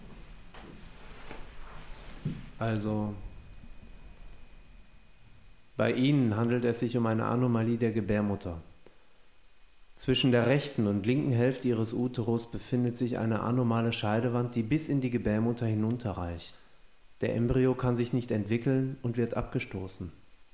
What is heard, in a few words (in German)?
Wiegenlied